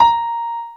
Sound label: soft hit whistle